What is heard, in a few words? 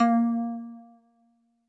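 Jazz Harp Kawaii A#4; keys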